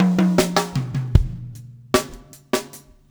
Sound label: SLICK